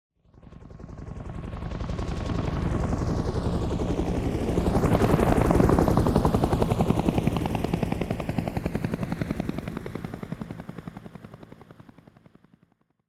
Helicopter flying overhead pass by

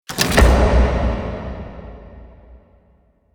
Stadium spotlight hit arena entrance

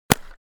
Paintball gun single shot; sport

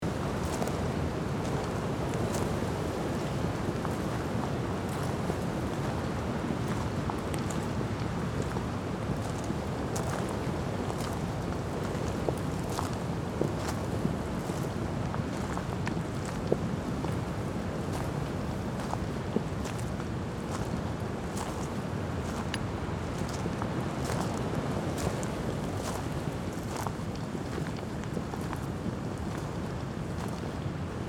Volcano crater edge ambience loop; nature

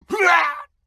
Death scream man dying pain

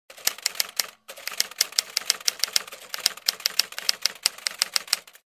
Royal Classic vintage typewriter typing

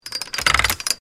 Safe vault dial lock turning click; gears

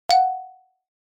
Text message alert

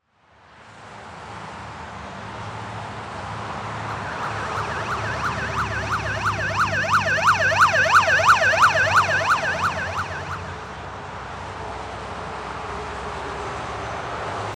Police car siren passing by traffic city

